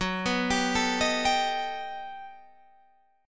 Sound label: G Augmented Major 9th